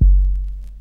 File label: old school low tone kick f sharp key; kick drum samples